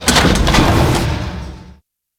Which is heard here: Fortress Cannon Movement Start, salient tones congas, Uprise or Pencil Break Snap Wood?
Fortress Cannon Movement Start